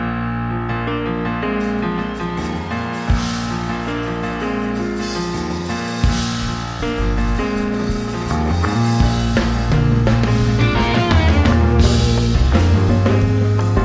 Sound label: jazz